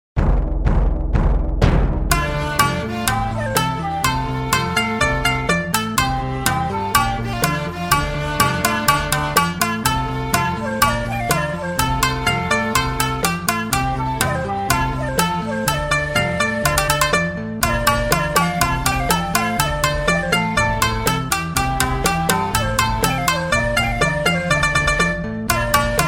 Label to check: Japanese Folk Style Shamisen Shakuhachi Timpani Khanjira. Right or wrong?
Right